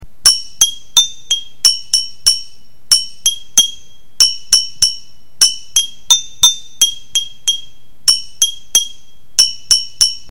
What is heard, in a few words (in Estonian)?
pudeliksülofon